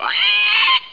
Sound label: screech